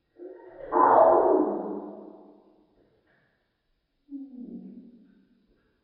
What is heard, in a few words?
bird budgie song; ambience; dfcaverns